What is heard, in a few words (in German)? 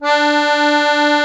AKK VIOLIN D, akkordeon; Best Service; Alpine Volksmusik